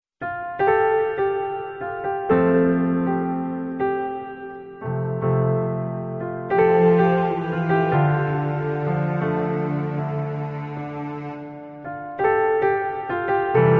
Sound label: piano